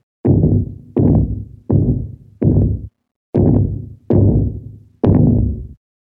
walikng on metal Texture

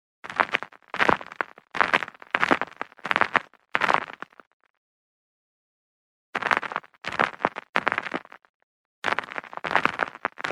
gravel road

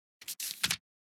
FLIP A page

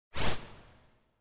UI comform sound

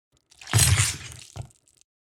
pulling out KATANA

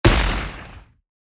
desert eagle

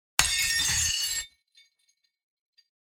clacking glass

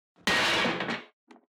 metal texture

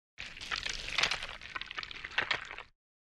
sticky movement

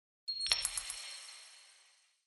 UI comform sound

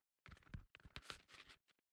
grabbing

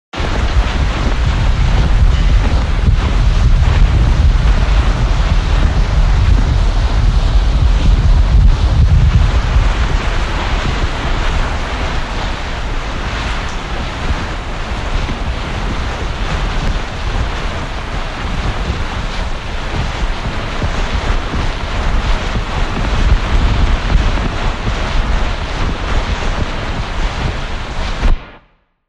heavy rain

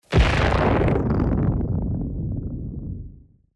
Superhero inbound

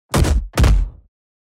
SMACK

